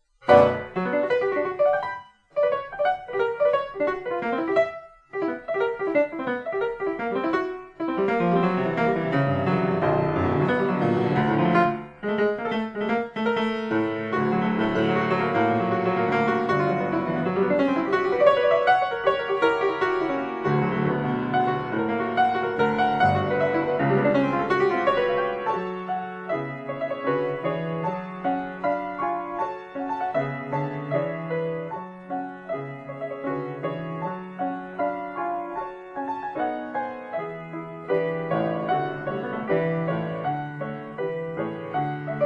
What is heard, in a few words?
Improvisations, piano; Poulenc